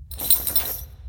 lobby landing armor; smite; chiron

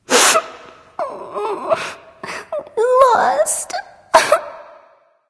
boo hoo; smite; voicepack

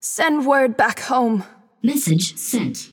death

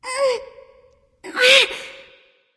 grunt lift; smite; voicepack; hel

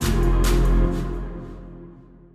recall mus; smite; hades

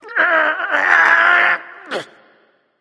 grunt lift; smite; voicepack; loki

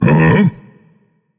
confused; smite; atlas

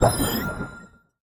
ally cleanse; smite; god atlas

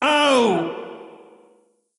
ouch; smite; kukulkan

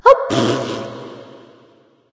tongue light; smite; voicepack; hel

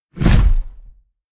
swing earthy; Smite; Cabrakan

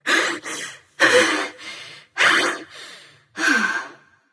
breath light; smite; voicepack; hel

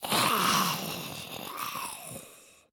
zombie run; smite; npc; roman archer

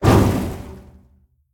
Fire; smite; god susano

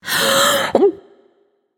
breath; smite; freya

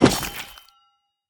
spear grab sweeten; smite; Anhur

